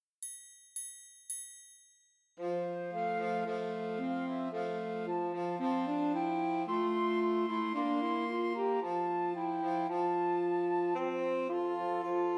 Hark The Herald Angels Sing, Christmas Trios for Flute Alto Sax and Tenor Sax